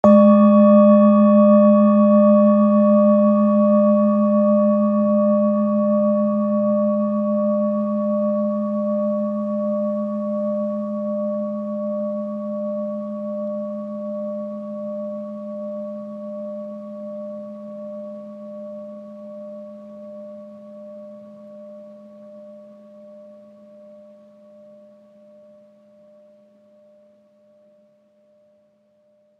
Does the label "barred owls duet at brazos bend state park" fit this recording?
No